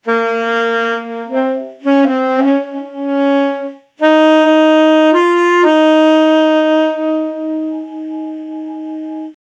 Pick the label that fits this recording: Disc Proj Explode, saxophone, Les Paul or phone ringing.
saxophone